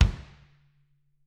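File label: bass drum; Bob Clearmountain Drums I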